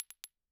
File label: Bottle Struck